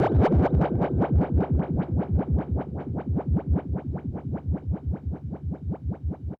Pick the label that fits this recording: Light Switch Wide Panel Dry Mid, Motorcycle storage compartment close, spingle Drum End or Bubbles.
Bubbles